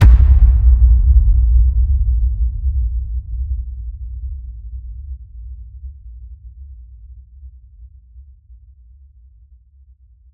HIT Boom, Cinematic Hits Designed

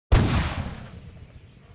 gun tank; NCH Library

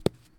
Baseball Bounce Grass

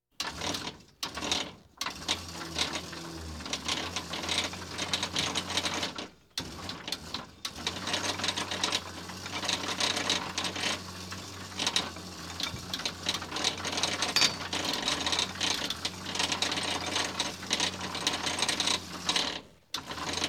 Police, Fire engines

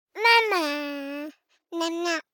BABIES TALK Boy 12 months Mama Nana Pitch; Baby Boom

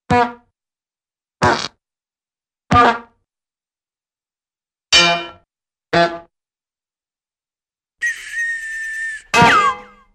Head Bonks Slide Whistle Honks, cartoon; Hollywood Edge; Cartoon Trax

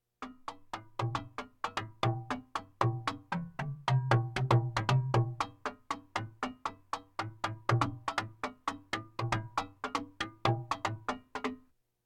Percussion Bongos Tympani rolls Gongs Drums

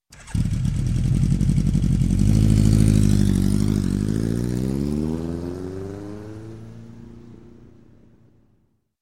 Motorcycle, 900cc Start, Pull Away; Sound Ideas; Series 2000

